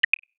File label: recording start